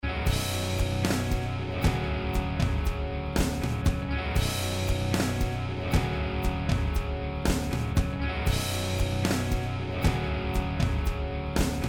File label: high shelf 10k, 10 db; waves Q1